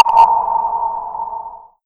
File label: soft hit finish